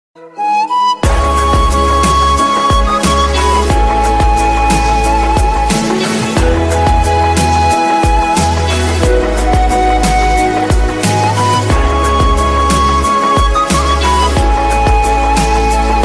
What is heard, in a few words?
flute instrumental music ringtone